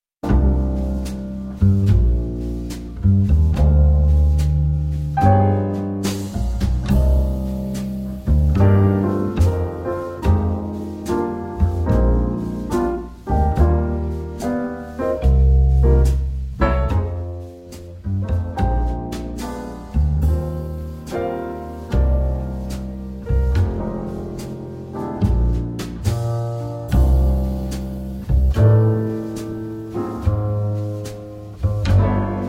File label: Ambient Jazz